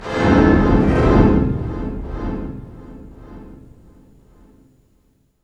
STR HIT, String FX; Roland - LA Composer vol. 2

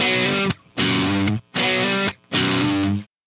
Riff Guitar Ending, Indie Rock; Sonic Foundry (Sony Creative Software); Indie Rock Anthems; Session 06 - Haze

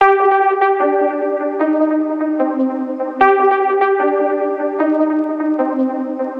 synth loop little blips 150 Cmin; Virtual Riot

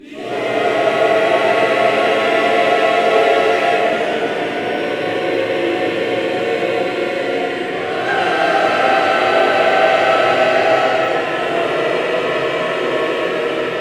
AVANTE G, Voices

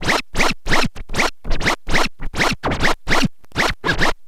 scratch short, Vinyl scratches

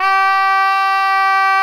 G 3, richter; SoundBytes - Early Patches

